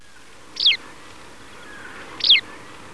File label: Anthus campestris